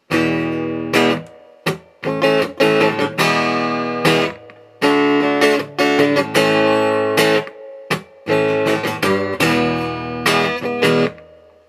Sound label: Guild G600 Reverb, amplifiers